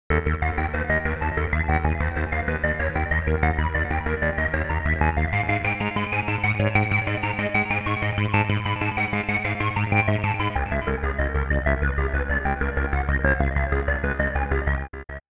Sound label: sequenced